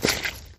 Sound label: step